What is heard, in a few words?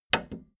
video tape player plastic stop click slow